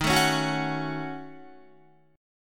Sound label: Eb Minor 7th Flat 5th, Guitar; Ebm7b5; strummed; JGuitar